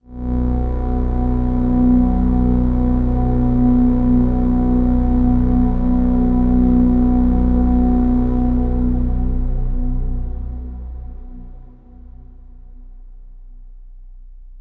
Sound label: Overlay Drone; Act1 Anchor